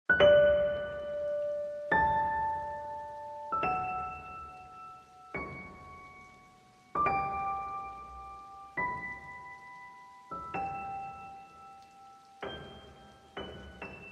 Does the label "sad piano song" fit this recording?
Yes